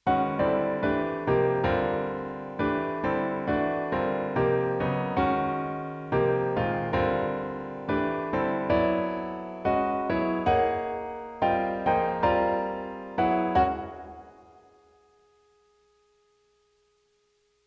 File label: Quartal Voicing Arrangement